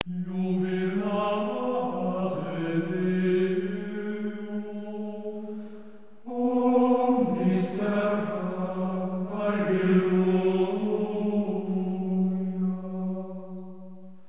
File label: chant, ambience